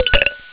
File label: ice